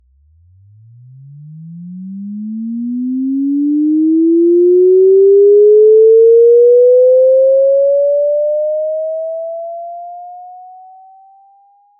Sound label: 440Hz pos chirp